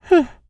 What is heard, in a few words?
laugh; Eternal Return; Katja; ENG